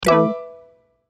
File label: error